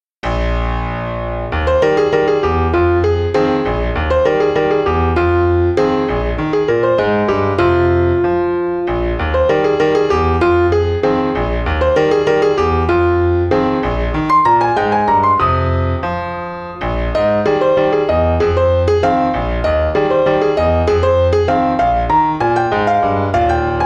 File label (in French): Ragtime style Battle